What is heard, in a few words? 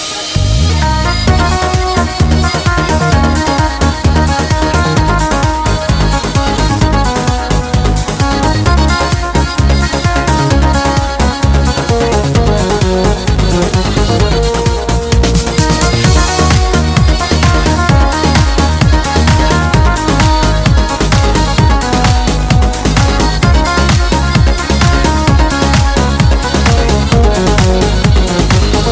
guitar ringtones